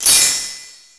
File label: Ring Bonus; Finding Nemo; XBOX